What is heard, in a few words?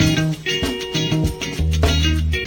guitar, Phonky Loops